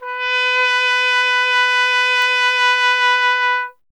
TP B; Miroslav Vitous - Solo Instruments VOL-1; Trumpet

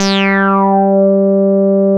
G4, Bass; CRANDLE; E-MU Producer Series Vol. 6 – Analogia Project (CD 1); Boom da Bass 1

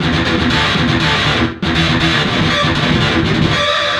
GTR, Chemical Beats

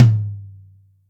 Acoustic Tom, Yamaha DTXpress

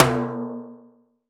Timbale Low, Roland BK-5; Percussion

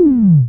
Perc Hit, Noise

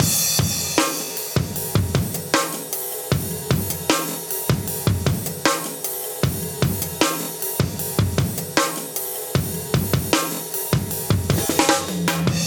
77 live breaks

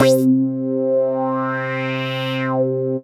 Synths Note C

